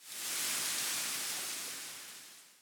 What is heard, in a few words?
tree wind rustle; background